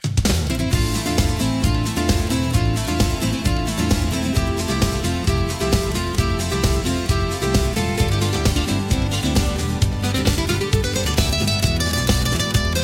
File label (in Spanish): Vino y Guitarra; teneryfe bailando